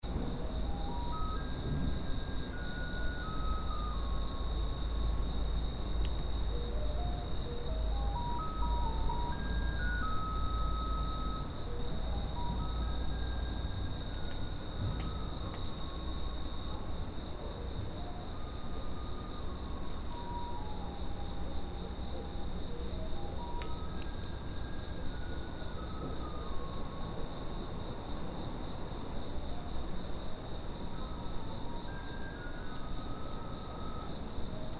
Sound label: mountainside